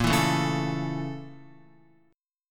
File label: Bb Minor Major 7th Flat 5th Bb down Guitar; BbmM7b5; strummed; JGuitar